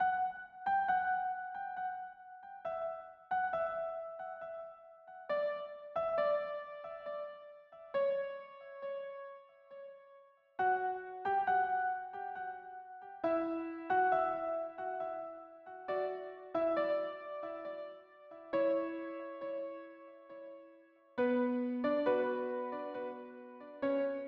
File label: moon improvisation